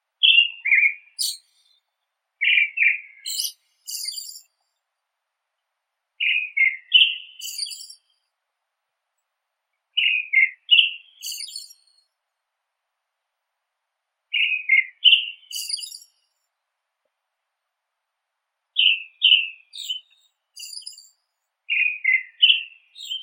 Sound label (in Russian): drozd zolotistii turdus chrysolaus